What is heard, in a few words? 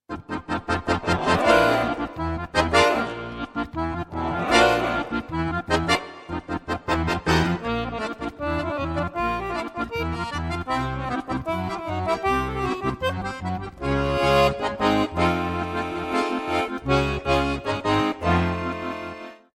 accordion; zzmusic